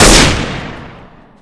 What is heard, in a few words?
fire; healscout